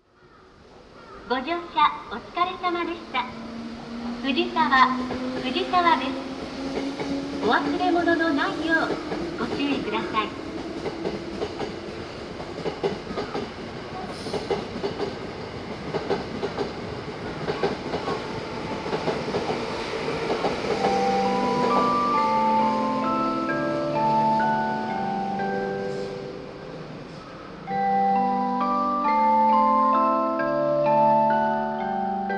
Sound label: melody